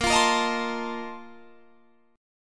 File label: Bb Major 7th Suspended 2nd Suspended 4th, Guitar, Drop D; strummed